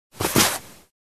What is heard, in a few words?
Box of clothes put down